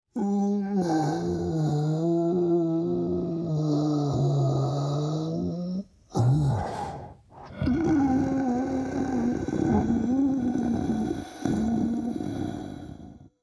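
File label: death and pain sounds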